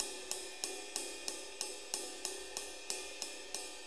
6-8 Ride, HiHat & Ride Loops

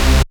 Basses Root C

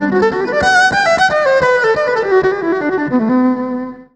EVIOLIN, ELECT VIOLIN; Best Service; World Colours

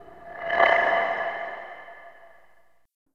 SKIMMER HI, PERC; NorthStar - The Wizard VOL-2; TSAR PERC

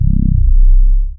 Bass C1, club techno; Zero-G

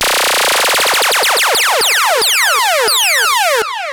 Monotron Sci-Fi

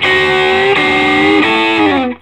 Vintage Blues Guitar, SOLO

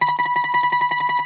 Greek Bazouki, Plucked Strings

